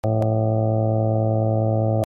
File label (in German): saegezahn 110